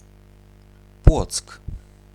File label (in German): Plock